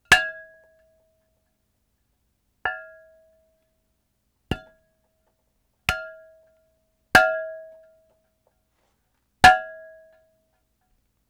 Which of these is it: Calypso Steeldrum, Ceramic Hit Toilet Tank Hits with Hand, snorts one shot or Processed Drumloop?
Ceramic Hit Toilet Tank Hits with Hand